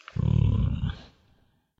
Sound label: growl, Shadow giant grows and grumbles; Hound of Tindalos; SyrinScape; Iris hill manor